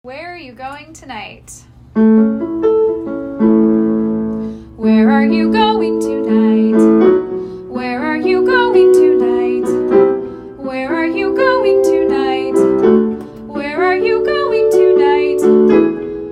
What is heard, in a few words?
WITH VOCALS, vocal warmups; Where Are You Going Tonight